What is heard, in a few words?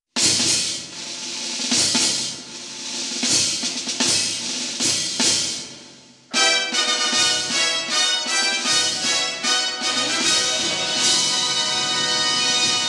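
clean silent orchestra fanfare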